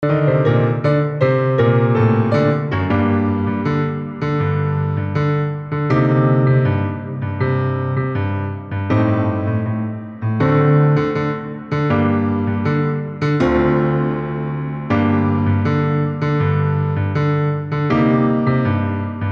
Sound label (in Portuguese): baixo e acordes